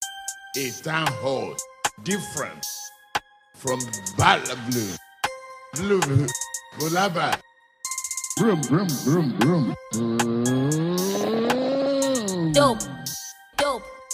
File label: rap